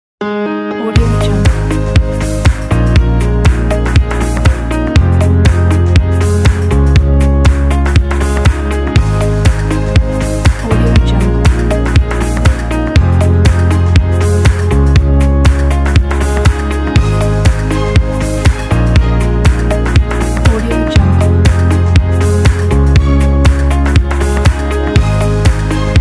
inspirational modern piano

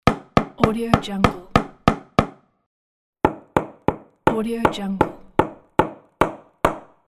fast light hammering on wood

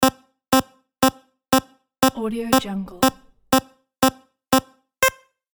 8bit countdown

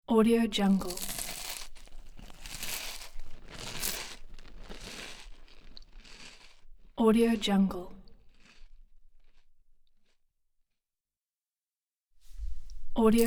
crispy and delicious; denis-nikitin

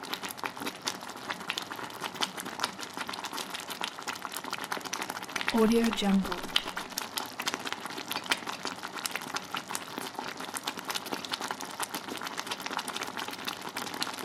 tomato sauce bubbling in pot loop

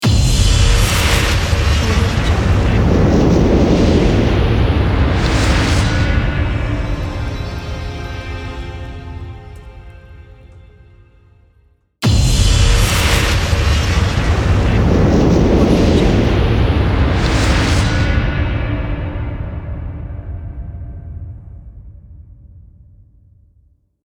smoke logo reveal; boomopera

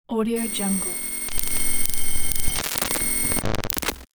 sci-fi radio

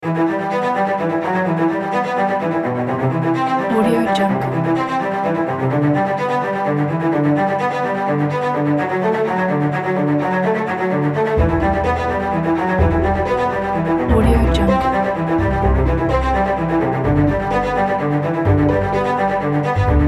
dramatic strings

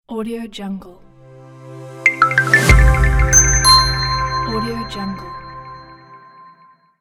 minimal business logo

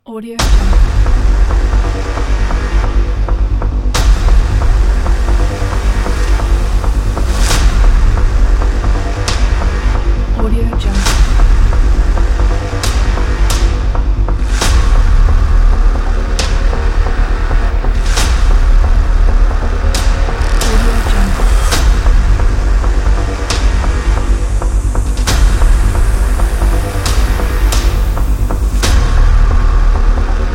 intense percussion trailer intro

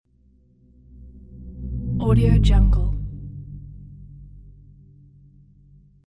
ufo sweeper; koreli777

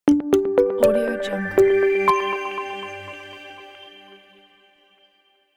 fresh minimal ident

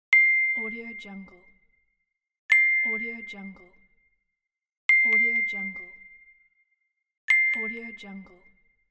the message notification

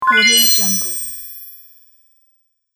casual game notification

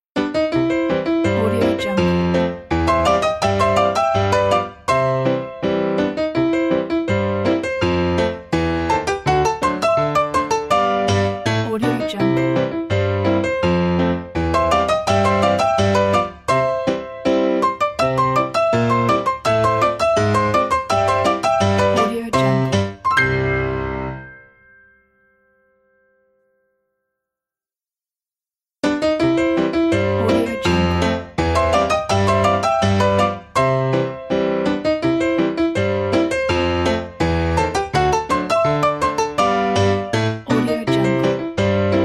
silent film logo

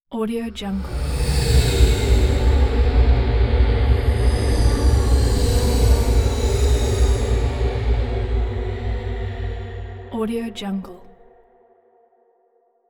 mystical airy logo; hollywoodedge

